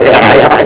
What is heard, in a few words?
head shake